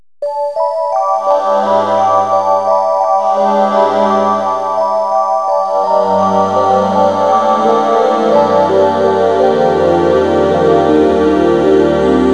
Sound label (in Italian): musica newage